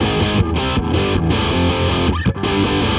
160 Indierock Guitar A Ab; Punk & Indie; Rock Hard

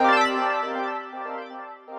Chords, synth lead; Ascii Chords; Ableton; Factory Packs; Punch and Tilt

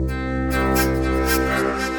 Broken Harps, distressed strings; Instruments; Ableton; Mood Reel

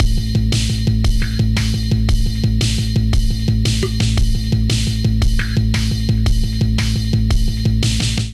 Nightly Kit Melodic 115 bpm, Drums; MIDI Clips; Drive and Glow; Ableton; Factory Packs

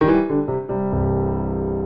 Phrase Blues C Dominant7 128bpm, Grand Piano; MIDI Clips; Ableton

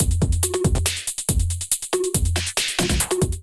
Preon Kit groove 140 bpm; MIDI Clips; 133 - 151 bpm; Ableton; Glitch and Wash

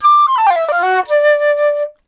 Chill Pop Flute, D; Pop Rock

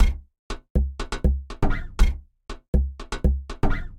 Zither Percussion Kit; drum racks; Ableton; Factory Packs; Lost and Found

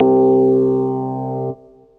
Suitcase Organ; Electric Keyboards; Ableton; Factory Packs

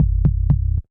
Ping It, Bass; Ableton; Skitter and Step

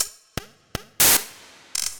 IDM Perc, IDM Percussion

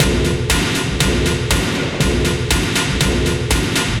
Doom Drums Kit; drum racks; Ableton; Factory Packs; Mood Reel

